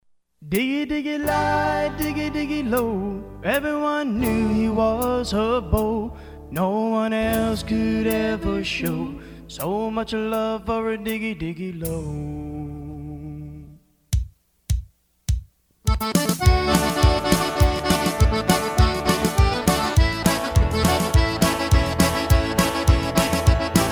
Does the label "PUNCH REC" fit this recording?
No